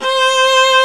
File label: Arco Viola C4; Proteus 2000; Multisamples; Digital Sound Factory Proteus 2000